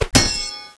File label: Blow